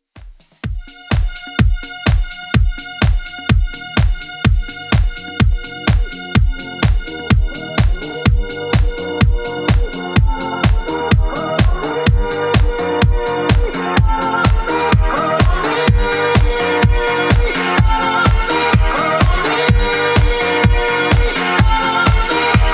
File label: 2ks Dance Redrum 126bpm